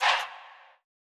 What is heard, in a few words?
Not Nice Chants, Vox